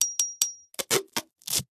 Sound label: repair; tool; Nuclear Tech